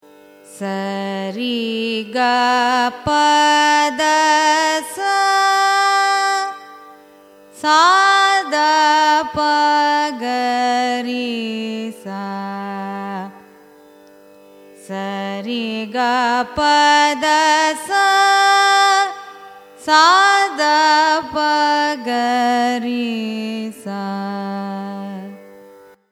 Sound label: raga vasanthi arohanam avarohanam; carnatic music